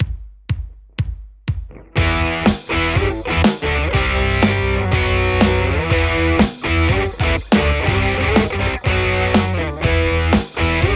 white tele; overloud; th3

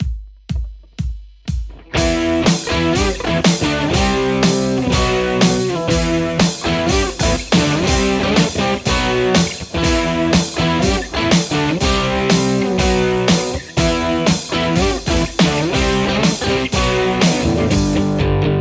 Les Paul JCM800; mercuriall

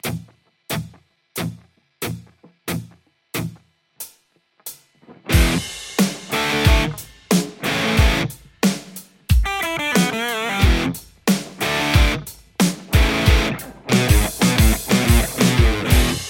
lespaul, emissary; igniteamps; emissary v1 00

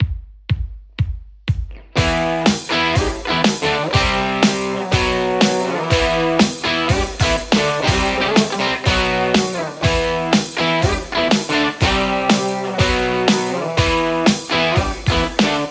white tele; acme; brain2

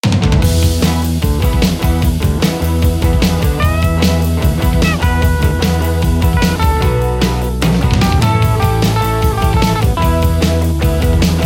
ballad strum strat; ampsims; kuassa Vermilion

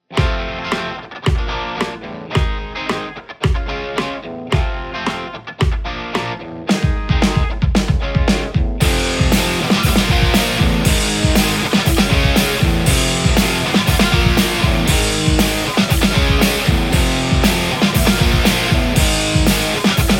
lespaul x30; tse

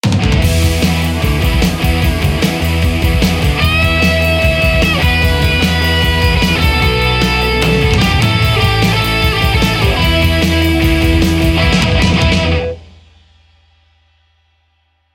creme crunchy mid strat; ampsims; kuassa

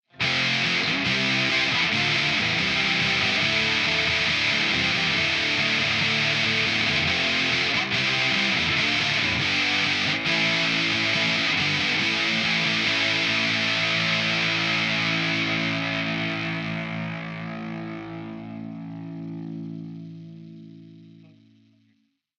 chords modern; ampsims; tse r47

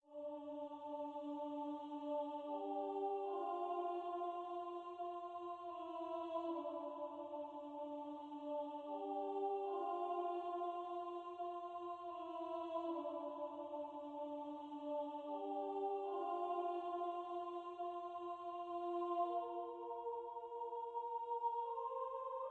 vocals reverb pan auto

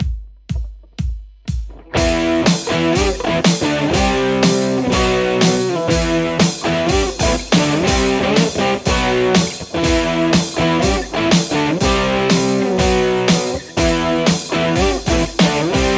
lespaul tomato; mercuriall

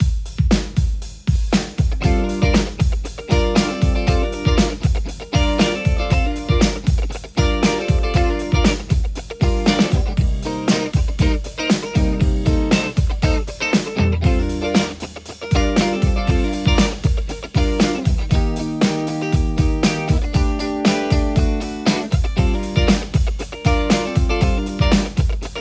lespaul lecto; poulin

